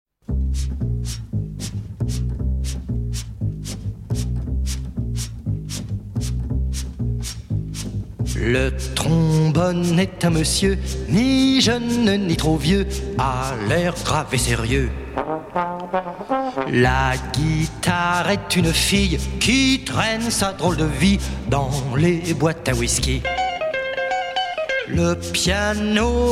Trombone, guitare

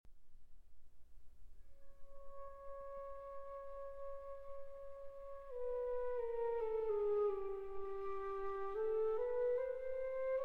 Flûte et Piano; Prélude à l'Après-Midi d'un Faune, L. 86 (Transcription Pour Flûte et Piano Par Gustave Samazeuil

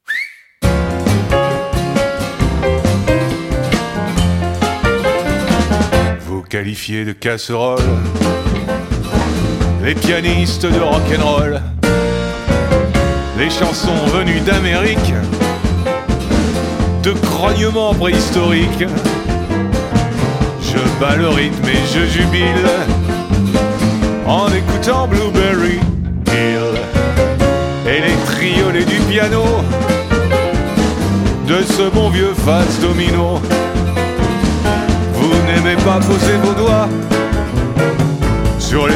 Piano à queue

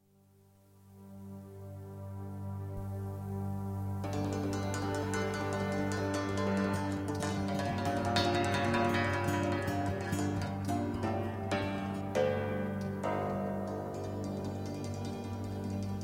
Choeur; Choeur De Radio Belgrade; La Nuit

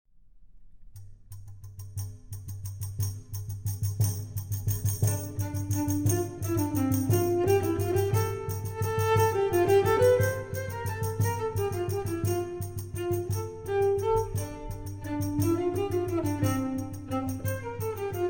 Gallardo Pour Violoncelle et Tambourin; Kobekin

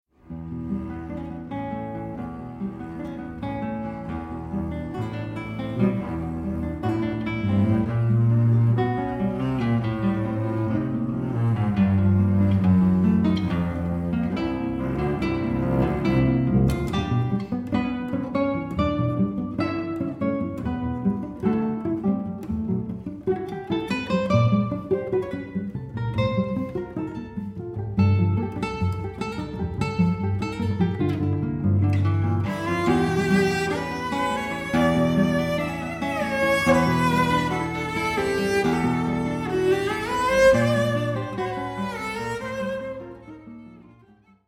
Sonate pour guitare et violoncelle, Allegretto comodo

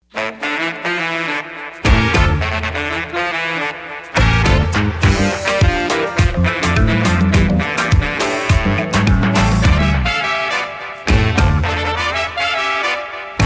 Tambour à Deux Peaux

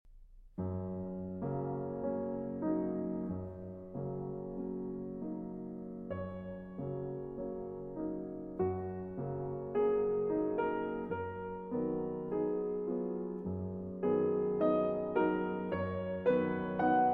ballade pour piano seul

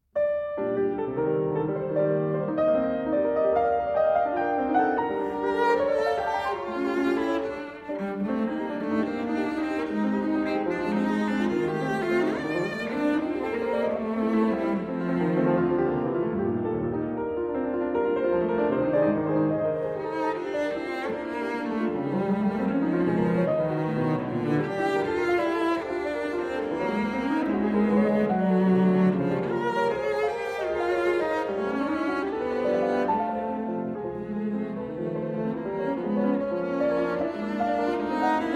Sonate en Sol Mineur, Allegro Vivo

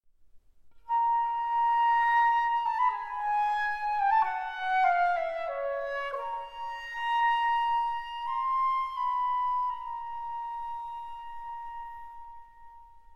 Flûte seule; Syrinx

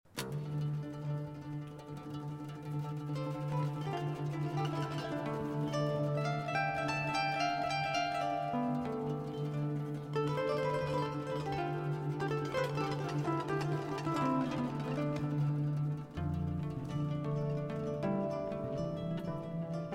Chant de griot

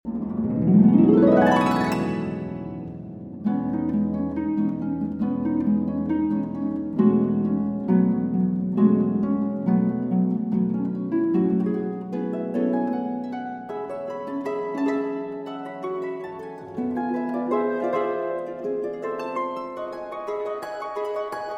Deux Impromptus Pour Harpe II. Animé

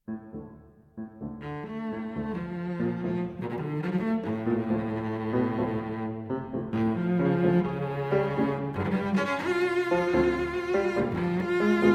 Sonate en Ré Mineur, Allegro

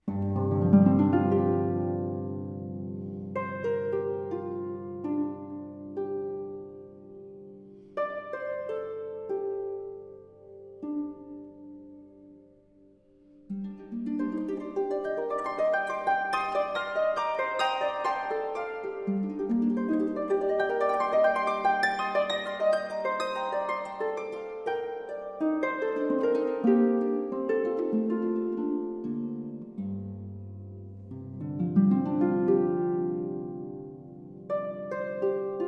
Harpe

